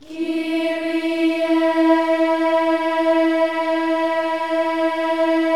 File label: KYRIE, Symphony of Voices